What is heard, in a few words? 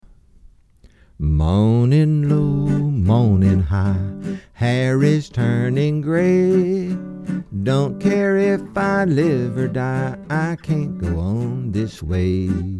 moaning